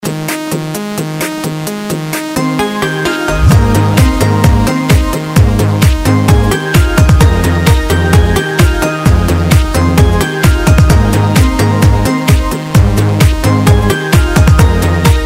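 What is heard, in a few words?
Reprised background music Ringtone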